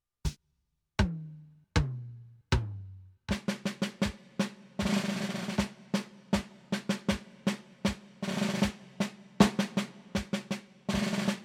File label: Roll Base Tempo